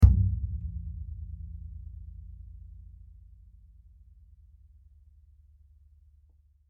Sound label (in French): Boom table